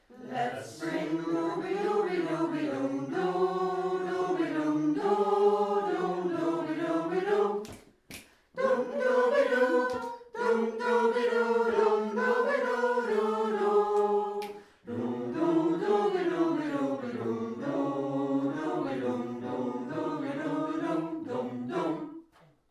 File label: Lets swing